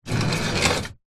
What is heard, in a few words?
office metal wire tray drawer slide